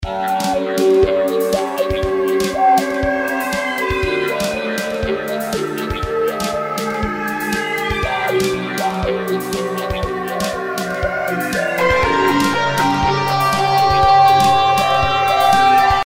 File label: Technotrance